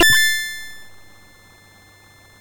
Taipan sfx, MOS6581R4

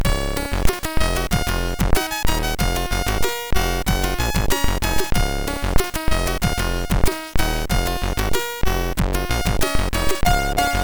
sid MOS6581R3; Childish

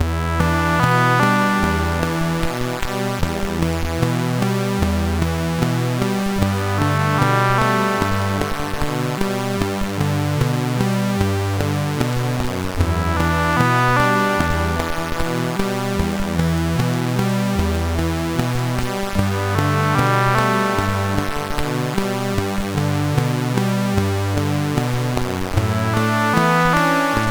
Sound Expander Intro; Hulten Jonas

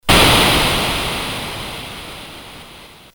BASIC sid CSG8580R5

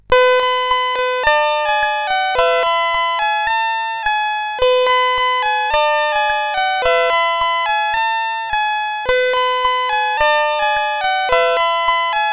Countdown, CSG8580R5

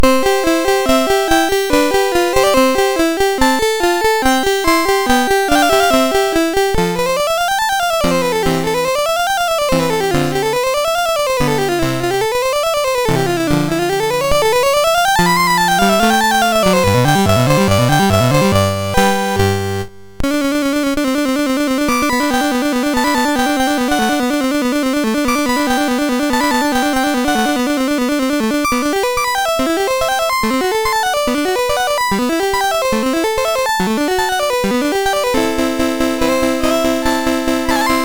Sonata in C, MOS6581R2; sid

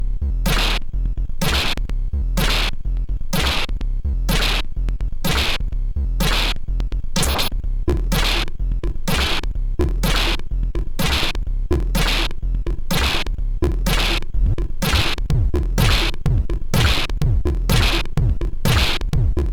sid, MOS6581R3; Unstable Waves

